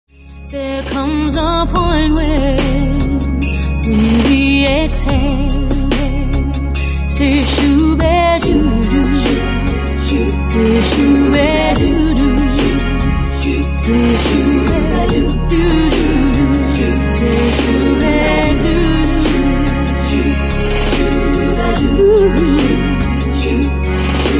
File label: Exhale